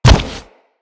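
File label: footstep on metal, enemies; Footsteps; Grineer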